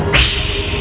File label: hiss